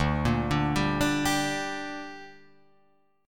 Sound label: D Suspended 4th Sharp 5th D down Guitar Open F, chord; arpeggiated